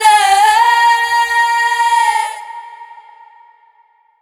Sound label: girl vocal; breverb